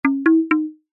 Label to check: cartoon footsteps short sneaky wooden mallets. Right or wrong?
Right